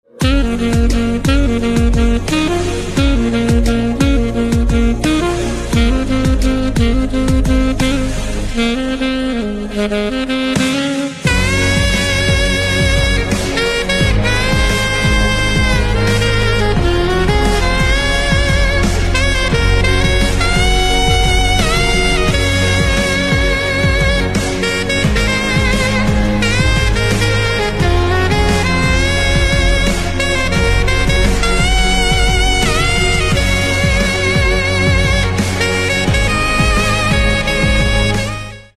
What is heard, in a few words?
Saxophone Instrumental Ringtone; english instrumental ringtone